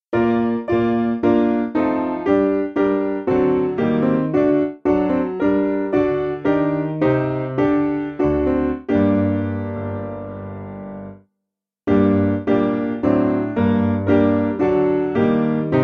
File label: Where Are Thy Old Beddgelert Piano; Simple Piano; 2/Gm